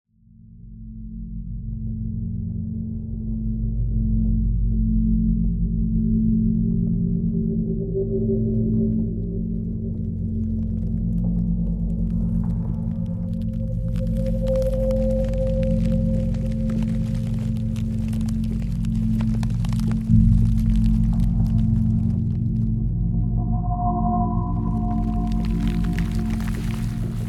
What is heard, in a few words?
soundscape; Topophobia